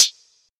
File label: short reverb closed high hat sample d sharp key, Royality free closed hi hat sound